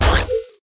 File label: icecream shield impact; Blind Drive